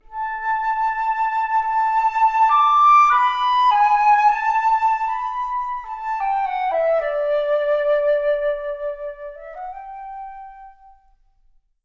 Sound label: Flute